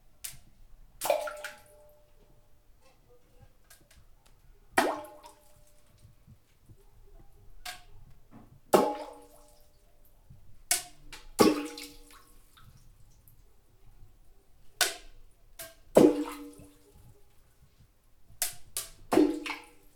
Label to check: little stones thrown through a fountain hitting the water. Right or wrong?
Right